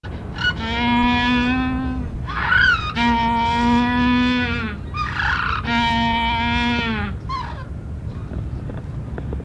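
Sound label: hunboldt penguin, フンボルトペンギン 鳴き声; 上野動物園; 多摩動物公園; 井の頭自然文化園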